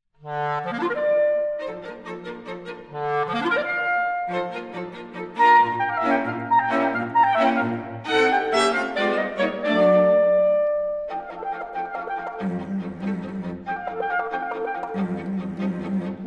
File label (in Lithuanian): Kvintetas; Weber